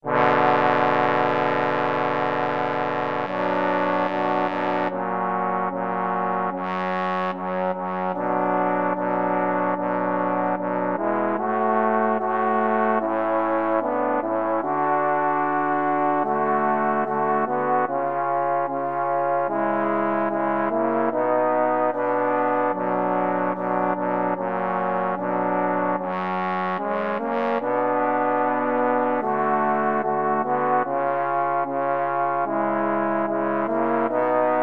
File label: trombone trio